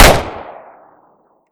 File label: smg1 fire, weapons; hl2dm